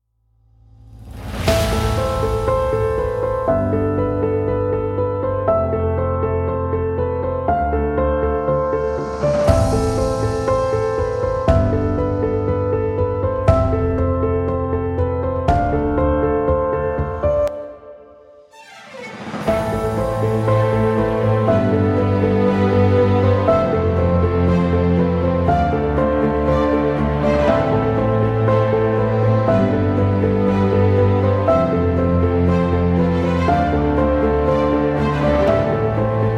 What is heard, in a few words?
Epic Drama